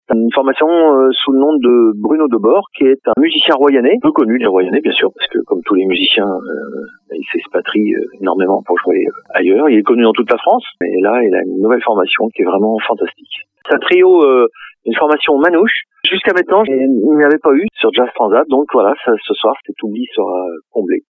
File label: jazz; transat